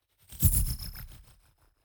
fly by insect drone flutter; swooshes-swishes

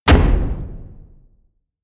hit wood, hits impacts

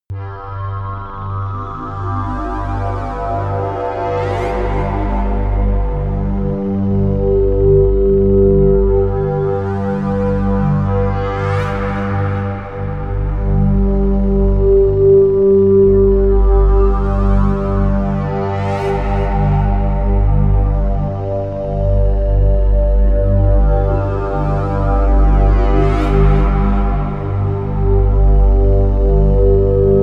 moving bass; flaechen

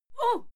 female fight scream huh short

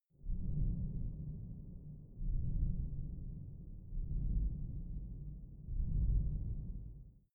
space shuttle pounding metal reverberant; raumschiff

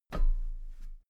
step metal ladder step top soft

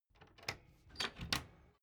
door flap loft unlock

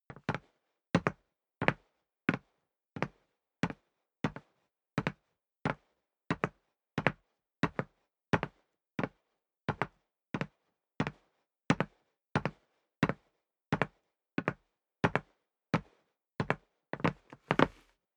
step boots wood parquet walk sequence

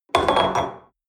espresso upset table medium, restaurant cafe

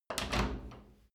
wood door old lock

